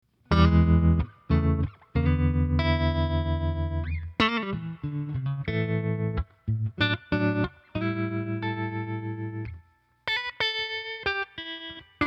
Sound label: Telecaster through 6V6 Princeton Classic Smooth Tremolo sounds playing with wave form; Terrain Deluxe